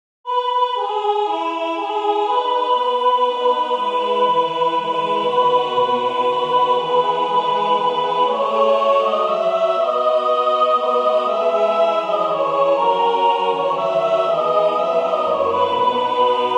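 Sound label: Chorus soprano; H.Purcell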